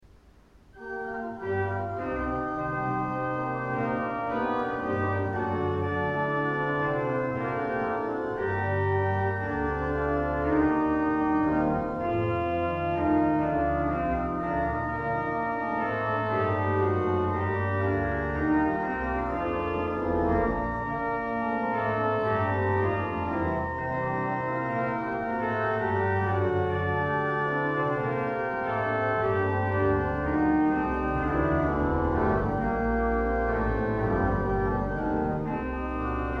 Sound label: Puer natus organ; Orgelbuechlein